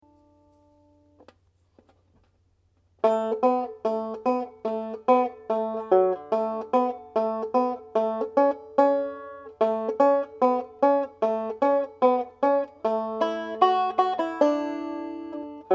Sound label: ragtime melody